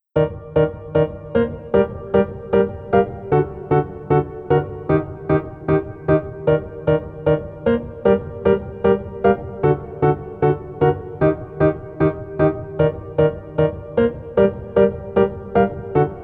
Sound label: Piano